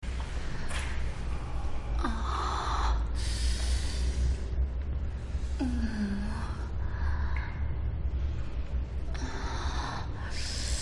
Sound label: moan, moans; The Witcher Hunt